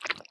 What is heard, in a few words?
Sex Slide